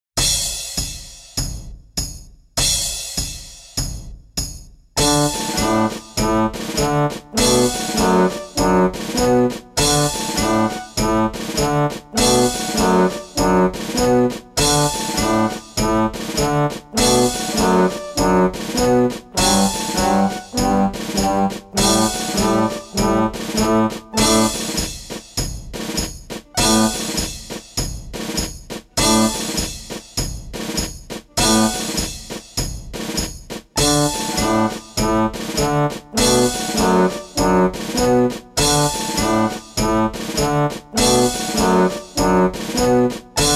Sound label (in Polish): marsz orkiestry wojskowej; Nagrania Lokomotywy